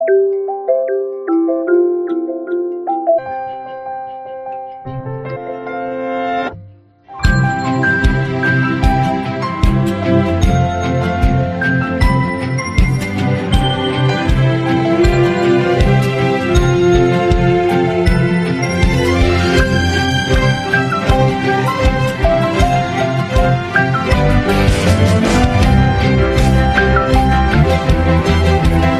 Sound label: ringtone; oppo